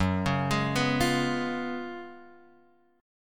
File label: F sharp Major 7th Suspended 2nd Suspended 4th F sharp, Guitar Open F; F#M7sus2sus4 arpeggiated